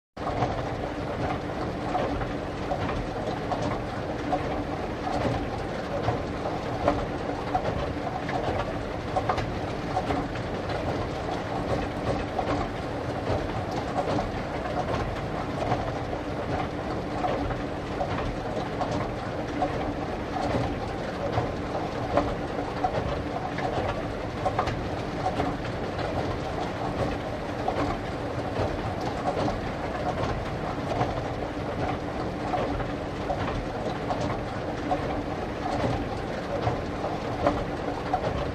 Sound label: Escalator Steady